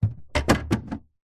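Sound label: household dehumidifier plastic reservoir close; zapsplat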